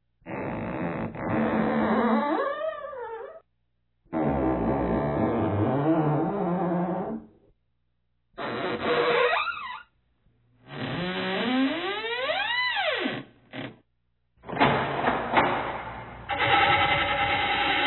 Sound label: Door Creaking creaking creaking